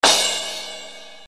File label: RX7 CRASH, Yamaha RX-7